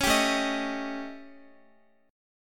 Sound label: A 7th Flat 5th Guitar; A7b5; strummed